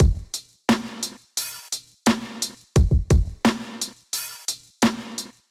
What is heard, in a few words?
Kit 87 bpm, hip-hop drums; MIDI Clips; Day By Day; Golden Era; Sound Oracle